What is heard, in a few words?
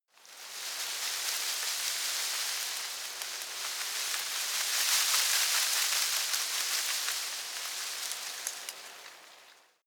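shrub little leaves rustle medium, garden; haus-garten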